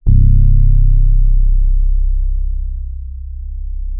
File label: MK1 C1, RHODES; Xsample VOL-1